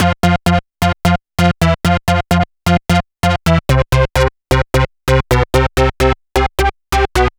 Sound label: Lead, Afterhours EDM